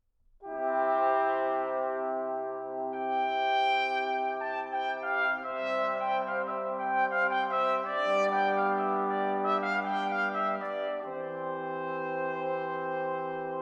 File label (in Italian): sonate per trombe basso continuo; Girolamo Fantini - Sonata „detta del Nero“